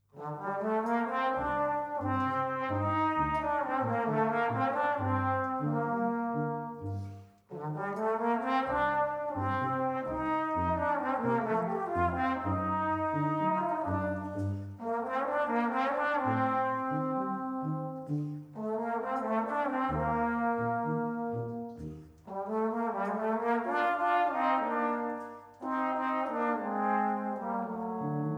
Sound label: Tango